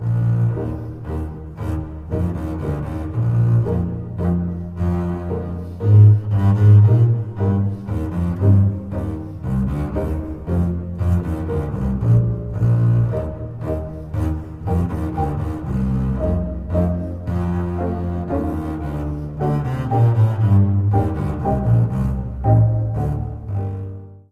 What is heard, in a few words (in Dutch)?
Contrabas; Saint Saens